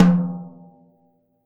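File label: Jazz kit tom, Drums Acoustic; AKAI; JAZZKIT 1; 90s sample CD; USB Soundscan vol.10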